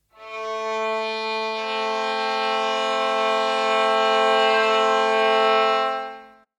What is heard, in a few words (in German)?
geige; kleine Terz